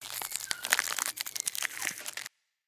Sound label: Egg alarm; We Are The Dwarves